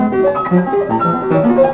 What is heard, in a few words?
piano